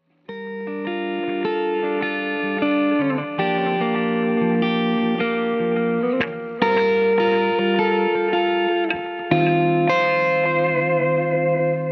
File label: Delay, guitar